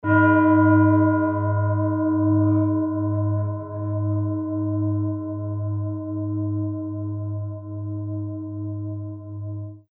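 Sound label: tibetan bowl uranusset, G sharp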